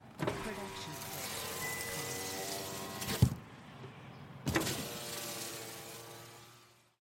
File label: car windows up